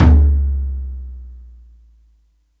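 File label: Open surdo, Roland MC-303 Toms; Drum Samples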